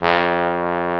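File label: trombone E1; AKAI S-Series CD-ROM Sound Library VOL-8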